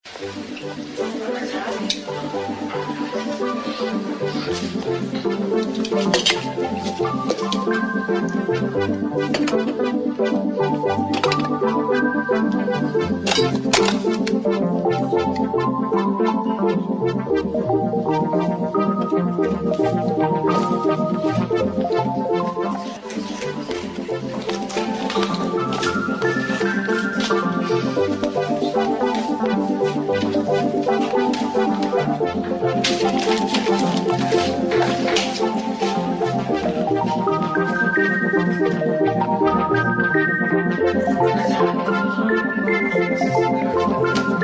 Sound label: funny theme